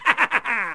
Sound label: LAUGH